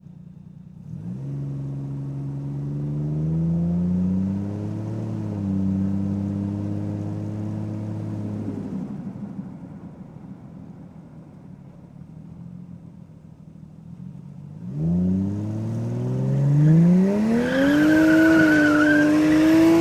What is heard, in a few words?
Toyota Chaser Mark 2 ENGINE various skid maneuvers